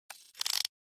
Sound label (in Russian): zvuki podzornoi truby